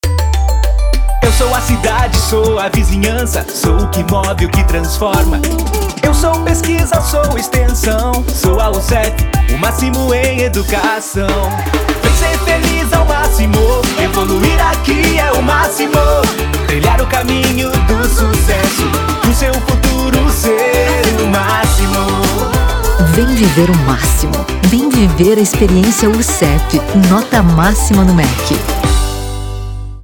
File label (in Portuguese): jingle institucional mix; uceff; produtoraup